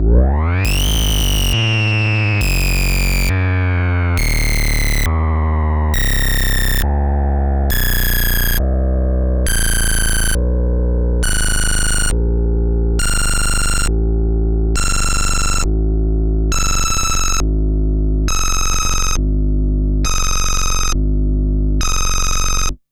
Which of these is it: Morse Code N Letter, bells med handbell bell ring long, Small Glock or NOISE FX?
NOISE FX